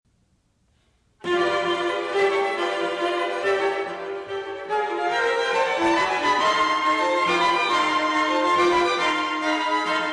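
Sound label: celebration fanfare, Orchestra Music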